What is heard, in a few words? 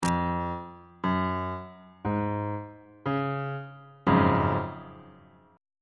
g flat major 7th suspended 2nd 3rd inversion on bass clef